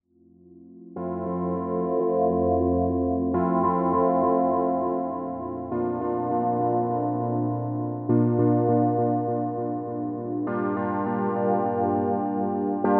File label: Reso wah Athmo